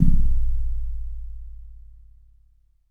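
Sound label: Jazz Harp C#4; Kawaii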